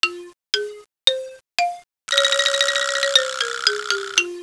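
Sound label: spook music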